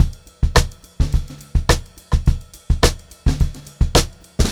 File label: VAR, Funk-Groove Drumloops; USB Soundscan vol.38; AKAI